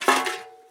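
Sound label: test ambience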